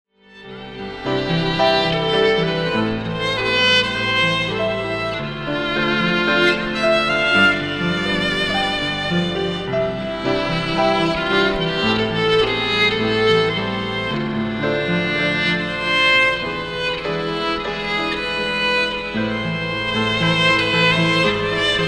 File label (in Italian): VIOLINO